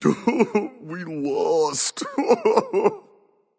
boohoo; smite; voicepack; set

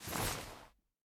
armor long; smite; hera

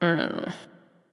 nv grumble; smite; awilix

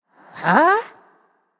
nv confused; cupid

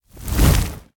coffin appear; Smite; Baron Samedi

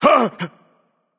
nv fear, vox; smite; voicepack; vulcan

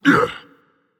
Grunt Jump Dragon; fafnir

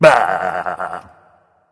tongue; fenrir

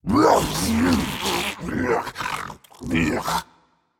clear throat, voicepack; smite; jorm

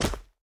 footstep metal; Smite; Vulcan

